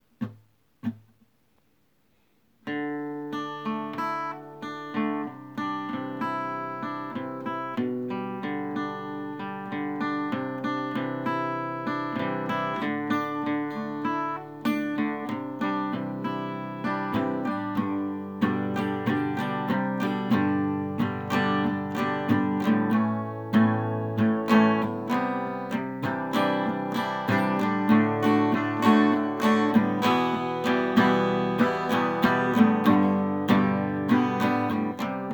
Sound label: music acoustic; flaming grill and supreme buffet